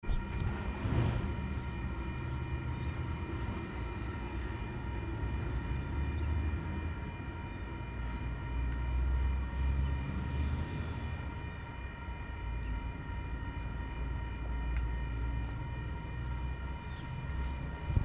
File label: train crossing; Port Chalmers